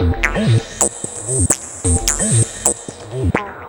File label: Synth, Techno